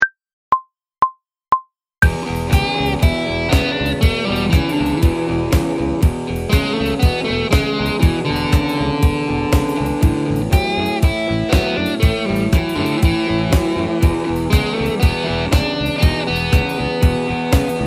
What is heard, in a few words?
Blues 12, guitarra; blues de 12 compassos